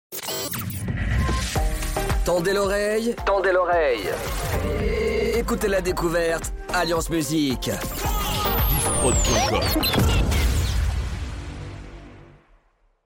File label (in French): Sweep Decouverte; Alliance Musik